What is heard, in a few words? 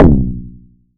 Perc